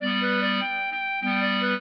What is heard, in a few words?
trio, clarinet; Mozart